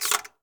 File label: Inspect Close; in-game sound effects; Papers Please; android ios windows gamerip 2013